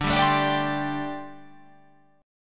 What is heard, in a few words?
D 7th Suspended 4th, down